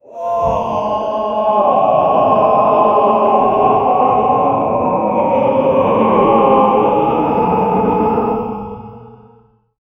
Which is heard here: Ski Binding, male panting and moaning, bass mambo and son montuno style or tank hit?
male panting and moaning